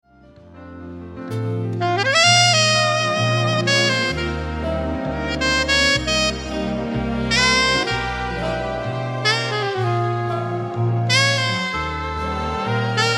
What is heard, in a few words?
Saxophone Ballad